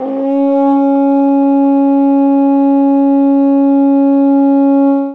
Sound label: rag dun tenor horn, c#3; World Instruments 2; WOODWIND AND BRASS